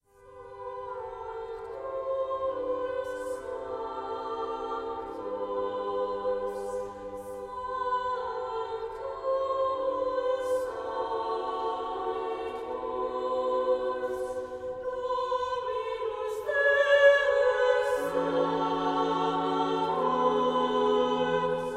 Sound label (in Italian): voci